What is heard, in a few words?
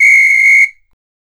WHISTLE, Brazil Drum Perc; E-MU Producer Series Vol. 7 – Old World Instruments